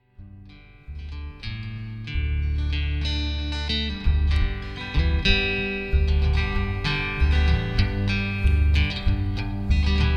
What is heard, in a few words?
Jam